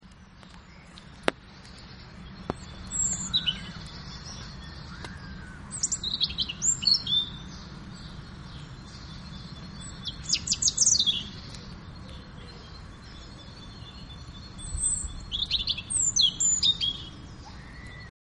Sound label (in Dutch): Roodborst Erithacus rubecula Geluid; Annelies van Klinken